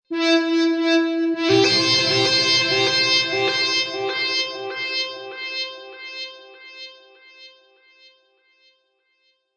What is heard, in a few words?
Synth Element Em 98